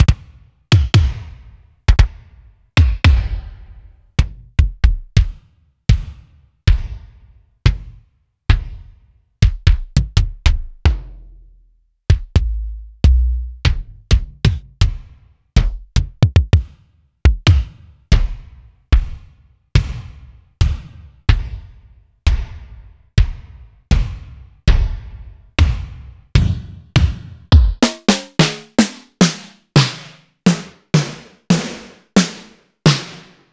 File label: Alesis SR160